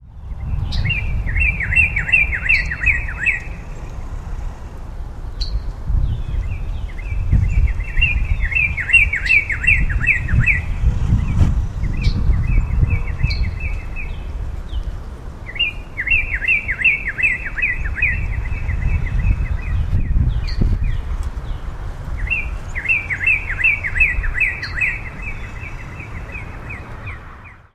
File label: two cardinals